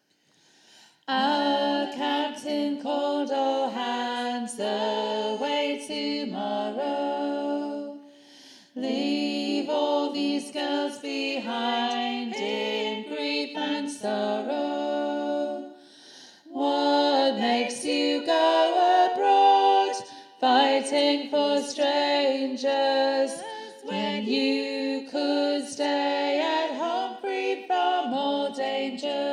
bass 8ve up; Gospel Choir; our captain; Whitby Songs

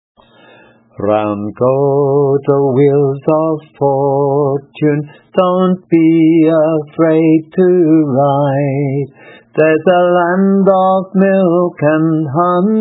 Bass

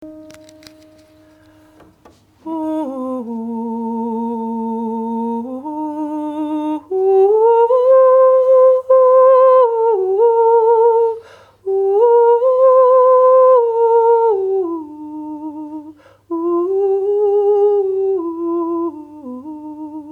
Wild Mountain Thyme sops

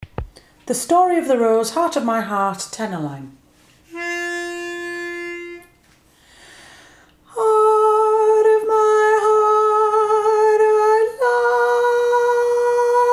Tenor; Tenor Line

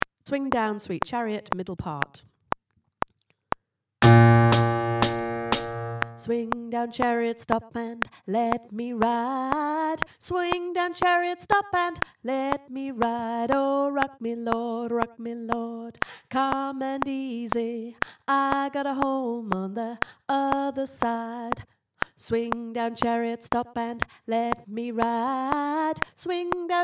swing down middle part; swing chariot

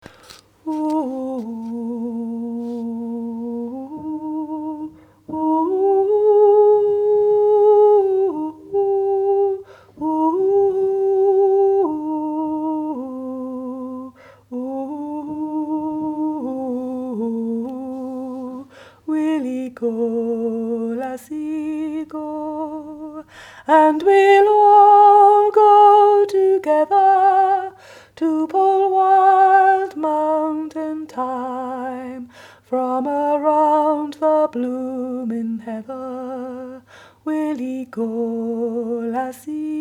Alto

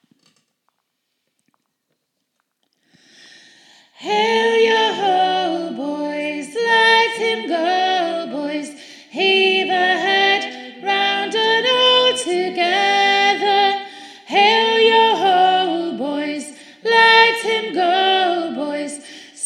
mingulay sop

